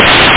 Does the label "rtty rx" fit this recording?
No